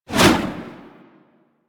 Spear Throw Fire A, Weapons; Warframe; Corpus; Spear Gun

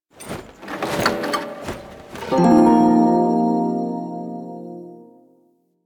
Prime Shawzin Equip; Warframe

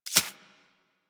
Arachnoid Micro Step Snow; Warframe; Corpus

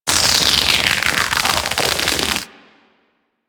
Fist Projectile; Enemies; DE; Warframe; Tyl Regor; Fists

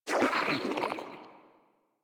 Frogman Pain, Vocals; Warframe; Grineer

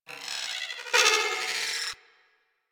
Beep Stutter; Warframe; Ambience; Corpus

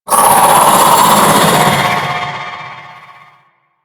Vocal Alerted; Warframe; The New War; Archon; Nira

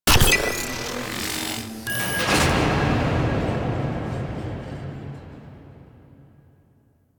Railjack Command Hack Start; Warframe

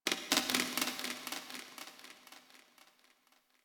Crp Ship Metal Knocks Texture Small; Ambience; Warframe; Corpus Ship Remaster

